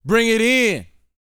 VOX, R&B Dance, HIP-HOP; Spectrasonics Vocal Planet

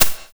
Drag HiHat; Sonic Foundry (Sony Creative Software); Drums & Drones; Construction Kits

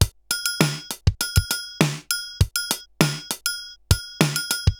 RX17 (100BPM), cyborg beats; musicradar

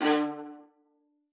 MART D3, VIOLA SPICC; Xsample VOL-5; 90 s Sample CDs

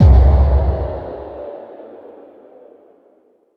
FX Kicks

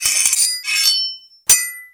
SWHIT, SWORDHIT; Total Control - Action Cutz

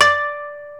KOTO D4; World Combo

